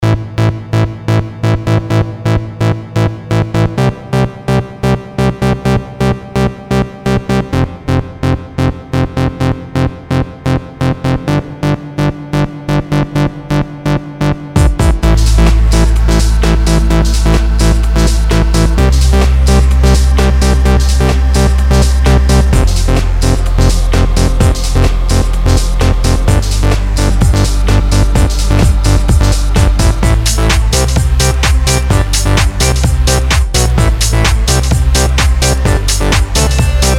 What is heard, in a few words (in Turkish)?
ARANJE ELEKTRONIK